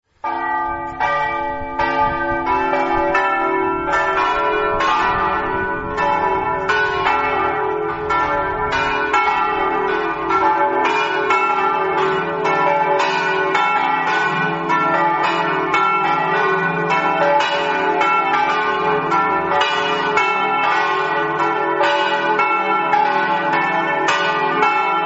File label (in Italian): campane; priva